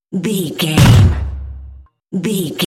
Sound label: dramatic hit deep electronic metal